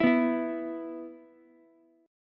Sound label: E 5th, Ukulele; strummed; JGuitar